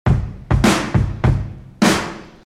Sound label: big beat